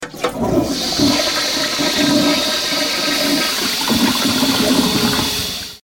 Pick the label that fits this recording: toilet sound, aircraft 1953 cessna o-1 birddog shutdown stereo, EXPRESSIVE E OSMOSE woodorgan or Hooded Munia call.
toilet sound